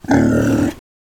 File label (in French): cochon